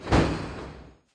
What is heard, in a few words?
Fld Elvarrival